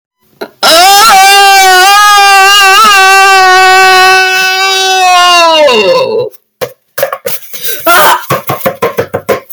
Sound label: scream clap clap